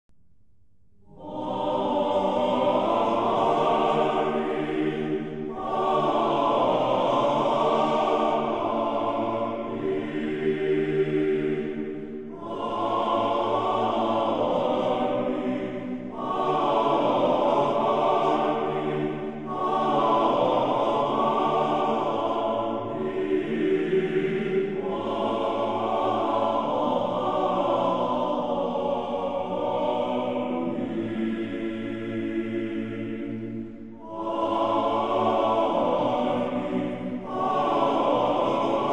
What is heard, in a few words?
Amen (before Cherubical hymn)